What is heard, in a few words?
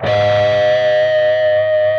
PRS A 2F, PINCH Guitars; Spectrasonics; Hans Zimmer Guitars VOL-1